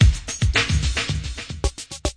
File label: 110 bpm thick echoes